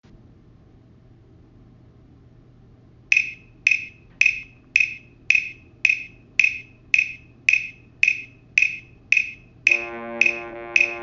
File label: low brass basses